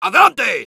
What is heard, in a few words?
battlecry, es; Soldier